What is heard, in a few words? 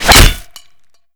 icepick hit; Modern Warfare